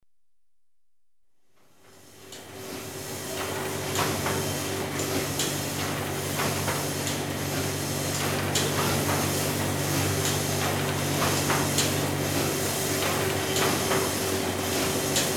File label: Engines slow then stop, with telegraph, Ships & Boats; The BBC Sound Effects Library